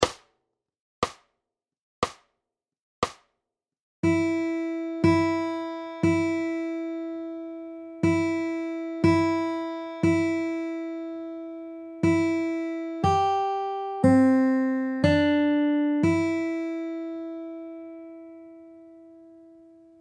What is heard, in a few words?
jingle audio